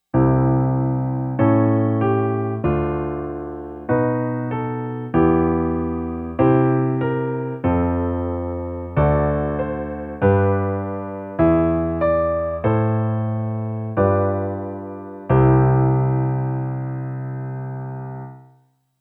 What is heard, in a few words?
Setting Up Scale Tone Chords